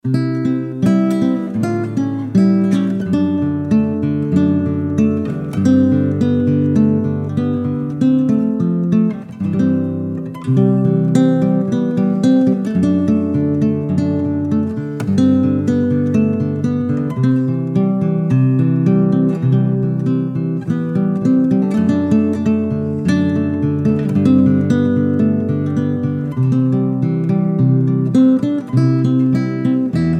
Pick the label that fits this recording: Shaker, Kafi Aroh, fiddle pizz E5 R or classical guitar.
classical guitar